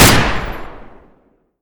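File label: machine gun fire; svencoop; deluge; weapons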